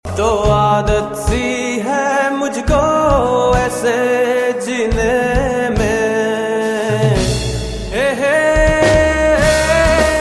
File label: hip hop